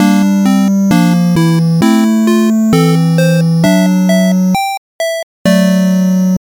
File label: gameover